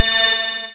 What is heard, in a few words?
laser